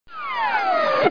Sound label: close; Bible Fun Pack; MAZE